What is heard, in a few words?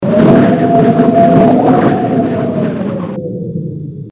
storm; classicro